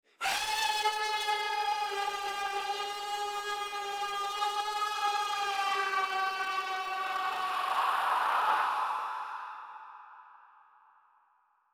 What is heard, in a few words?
a psychotic scream, frightening